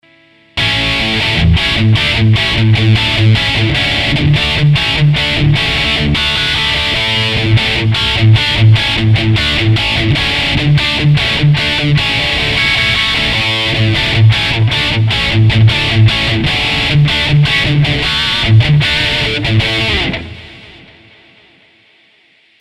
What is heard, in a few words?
American hi-gain rhythm